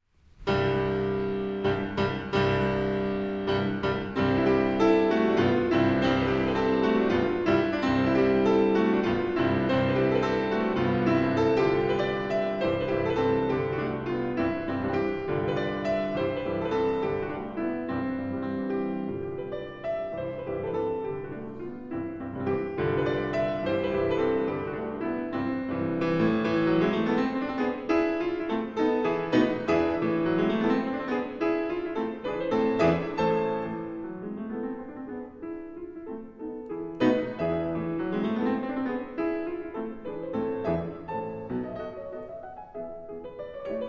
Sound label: Mazurka